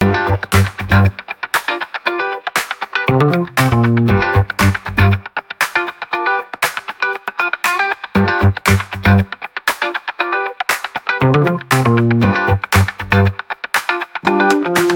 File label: 118bpm, disco fever